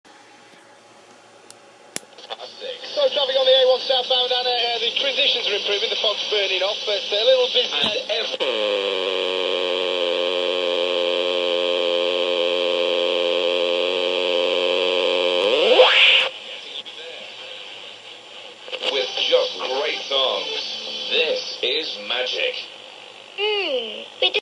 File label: radio interference